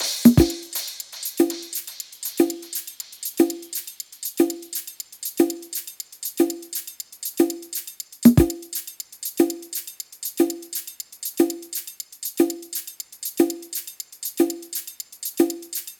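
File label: Top Loop 120 BPM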